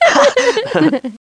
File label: dry laugh; SoftKey